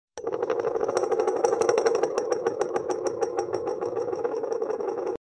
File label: spinning top